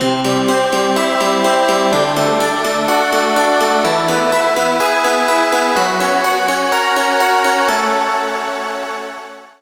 hope jingle one

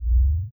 heart; animation show; kenoh

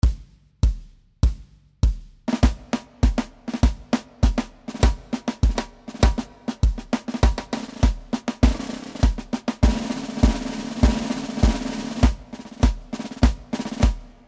march two-step

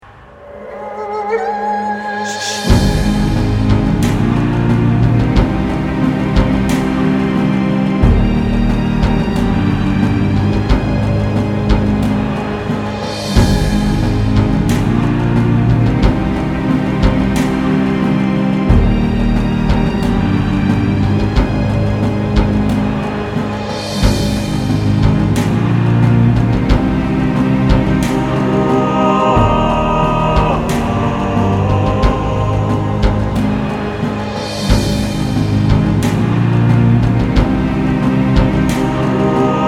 jingles

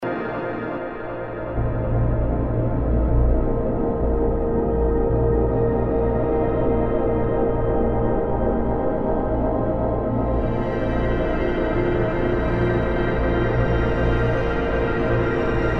mysterious anomaly

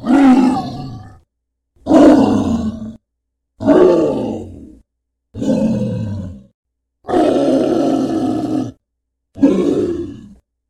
troll roars; big scary troll sounds

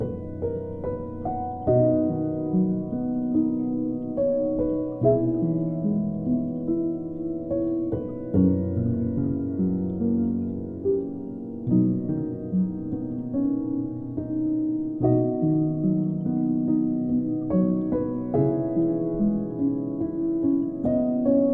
bluebonnet in b major looped

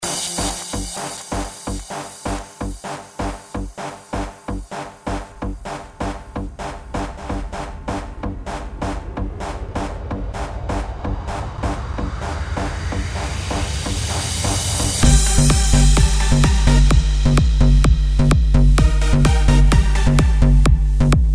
EDM; EDM is not my thing

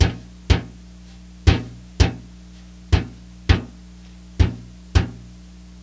ammo deep

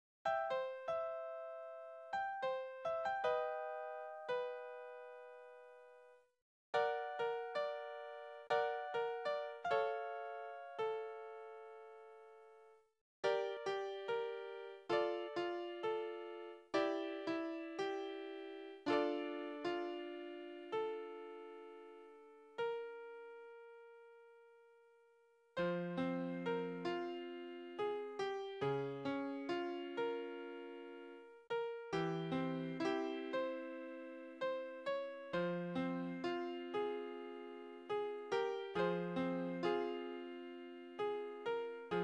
piano

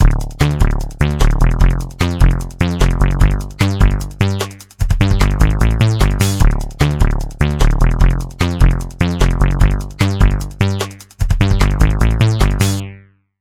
Fun Background

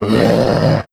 Zombie Sound

